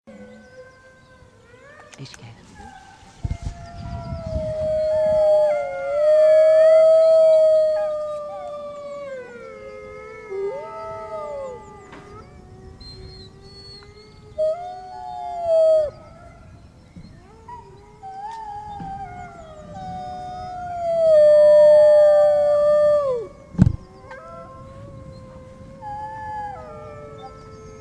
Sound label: wolf